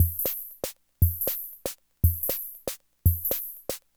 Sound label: Loop, classic machines, rhythm77